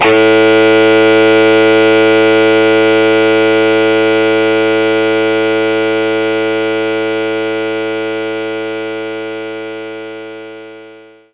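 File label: electric guitar E1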